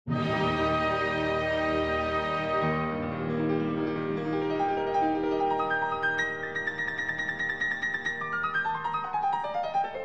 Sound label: Piano Concerto No. 5 in E-Flat Major, Op. 73 I. Allegro; Emperor